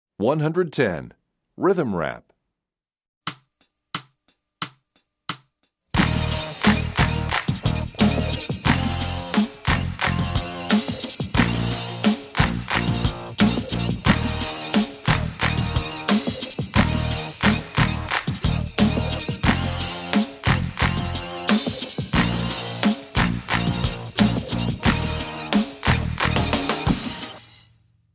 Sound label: Rhythm Rap, Percussion; EE2000 Book1